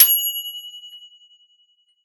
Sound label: ding; hurrycurry